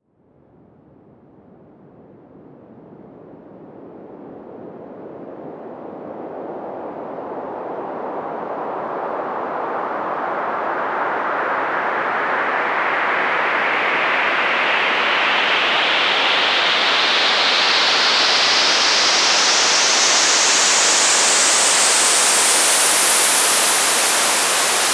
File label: long white noise liftoff, risers; whooshes rises